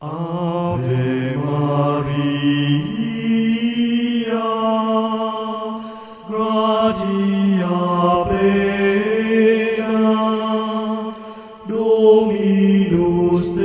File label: Ave Maria Gregorian Chant; thanh ca; latinh